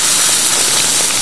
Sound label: stalker laser flesh; Source Engine; video game; npc